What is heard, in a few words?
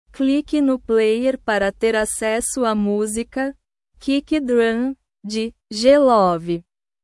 kick drum